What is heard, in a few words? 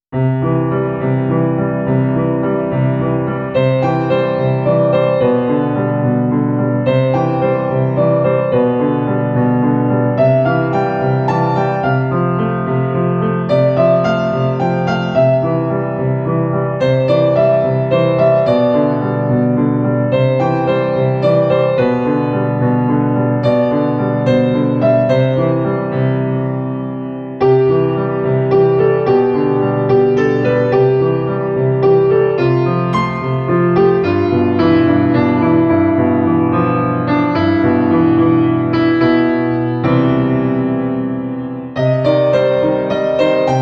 prelude, C major; jahangard ali